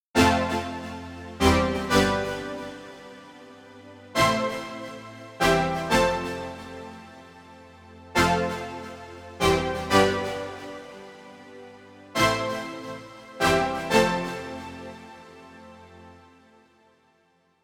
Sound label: junior brass